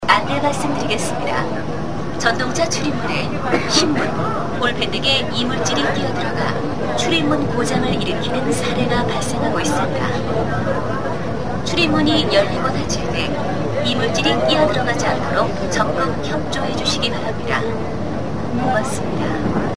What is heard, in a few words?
Line 2 door problem